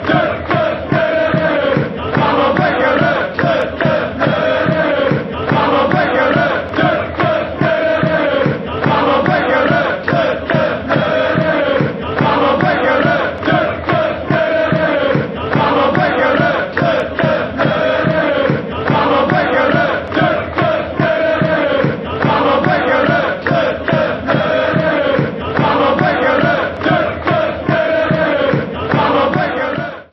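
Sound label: football chants; brandon mechele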